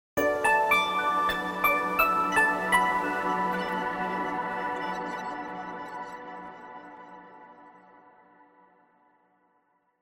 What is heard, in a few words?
Get Vault Keys (Jingle); Sonic Frontiers OST